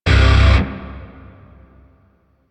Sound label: Shawzin Hirajoshi Chord A; Grineer; DE; Warframe